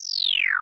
Menu Zoom; Menu FX

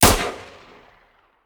Hand Gun; Sound Ideas; Guns

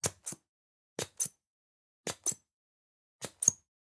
Generic Button Plastic Small

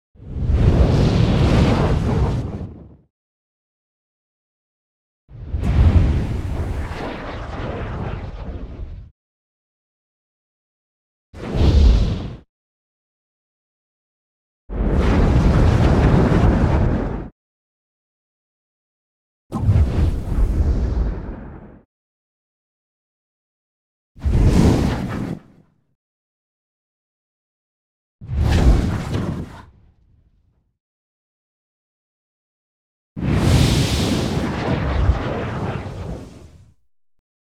Dragon Fire Whooshes, No Crackle Element; Sound Ideas; Series 2000

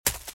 Footsteps Leaves Sprint Right; RadwinIsland

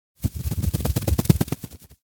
Bird Wing Flaps Away - Foley; Hollywood Edge; sound designer's toolkit v2

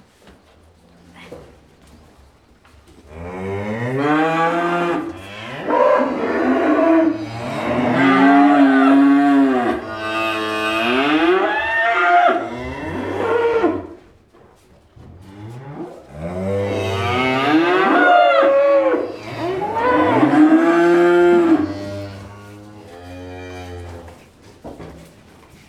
cows stable; animals; best service Blue Box

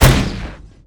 Grenade Launcher Shot; Weapons

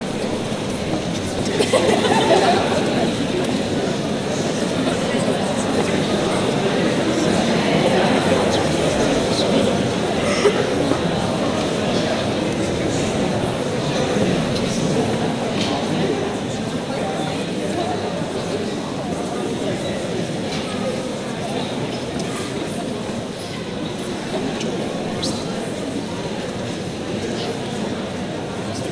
crowd musical, interior; best service Blue Box; crowds interior

